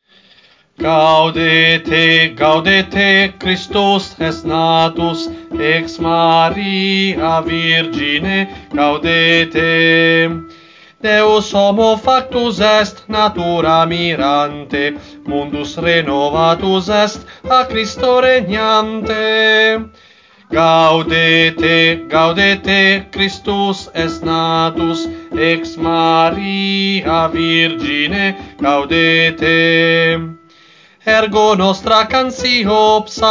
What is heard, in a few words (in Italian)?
contralti, Coro; Coro Isola del Giglio; Gaudete